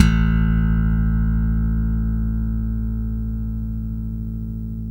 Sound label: 6 STR FUNK; Spectrasonics; Bass Legends